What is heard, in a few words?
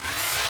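turret cannon power up; allegiance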